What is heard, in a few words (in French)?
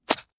tranchant; deadmaze